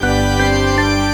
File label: Cool Organ; awe32